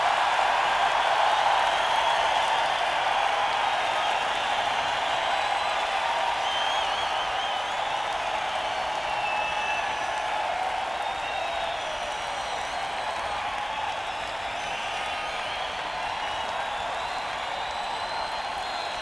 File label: Encore Break